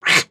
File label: Ribbit Frog Hat Bwah; creatures organic